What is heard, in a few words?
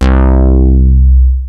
SPLIT; Rubber Chicken Software Sample Bank